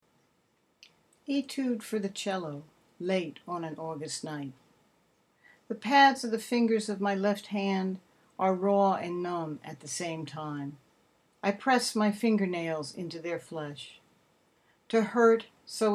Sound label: Etude for the Cello